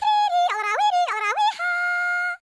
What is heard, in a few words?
YODEL, World Voices